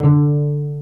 CELLO PIZZ; SOLO PIZZ ST; Greytsounds VOL-1

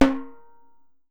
SOPRANO, Steel Percussion; E-MU Producer Series Vol. 7 – Old World Instruments

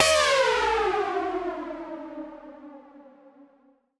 Siren Horn down, Ramp Down, C; future rave

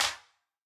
Wider Clap

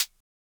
closed HH, Univox SR-95

